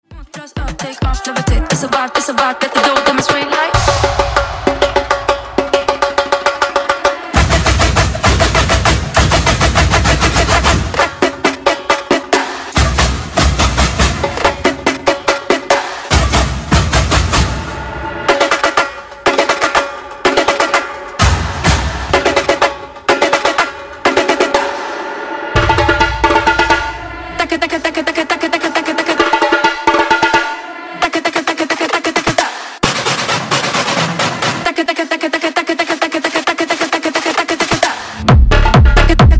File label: drums tech house breaker 132 bpm